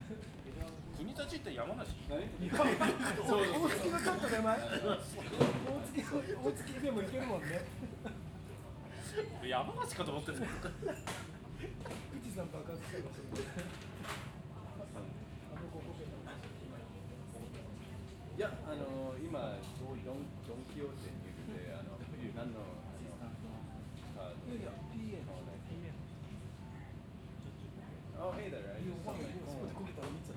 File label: superdelux ambiance; FUKUSHIMA; cal lyall; superdeluxe Tetragrammaton